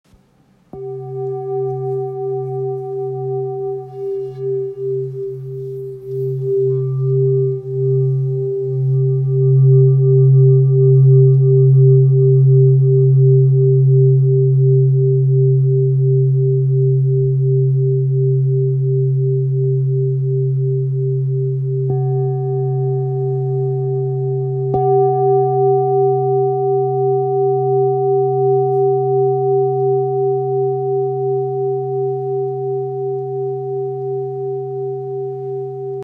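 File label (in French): Bol fulmoon grave fleur de vie do, bols chantants tibétains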